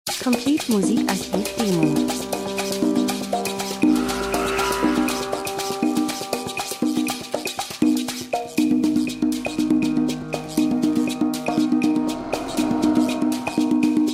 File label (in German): drums, rhythmus; Percussion Afrika Traditionelle Trommeln Sounddesign Land und Leute Buschmann Reisefilm Natur Dokumentation